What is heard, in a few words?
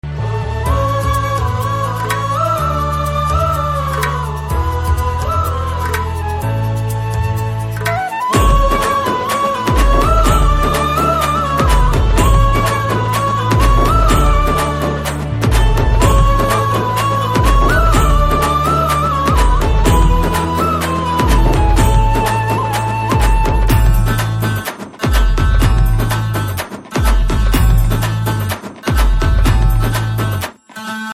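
background Tone Telugu Ringtone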